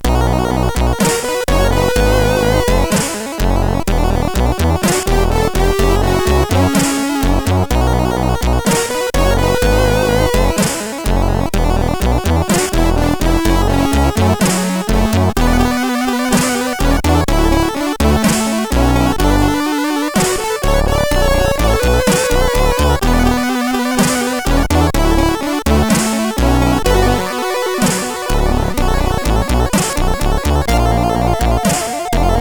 CSG8580R5

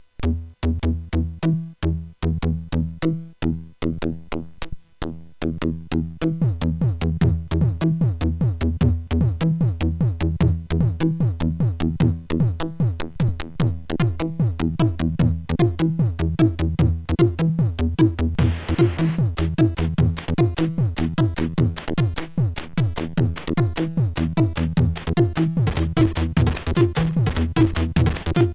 Basser, sid CSG8580R5; hvsc

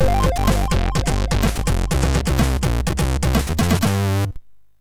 sid, MOS6581R3; Red Heat

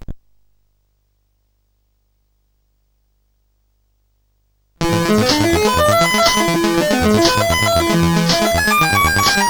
Lead De-tuned BASIC, CSG8580R5

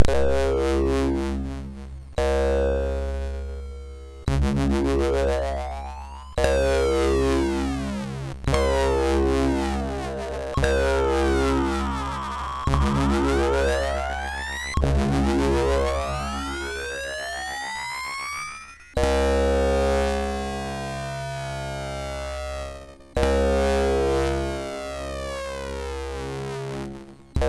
Synth Samples Sync, MOS6581R2

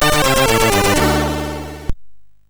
Intro Music, sid MOS6581

